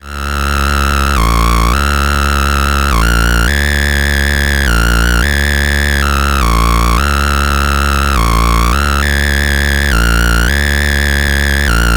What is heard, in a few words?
Pittsburgh Lifeforms SV 1 Spooky